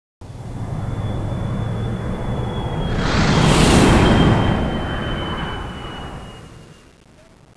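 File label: mothership flyover left; gearbox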